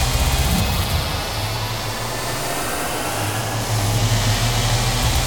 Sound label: reel re spin; spacewars